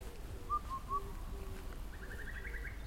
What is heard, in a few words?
cuckoo indian Cuculus micropterus